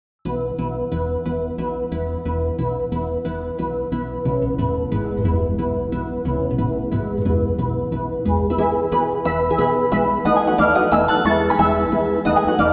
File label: Mazurka; Chopin